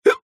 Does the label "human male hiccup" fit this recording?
Yes